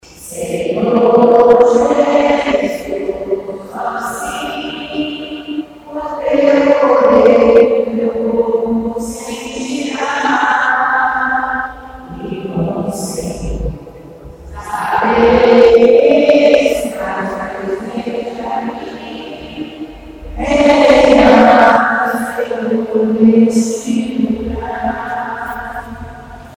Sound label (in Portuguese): canto